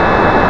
TURBINE; DEMOUK

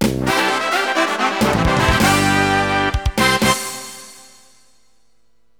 JINGLE

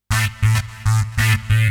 ZIPPER, KEYBOARD, HOUS TEC; MAGIX

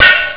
Clank; Gladiator Duel

